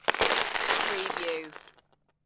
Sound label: Lego, several pieces drop on floor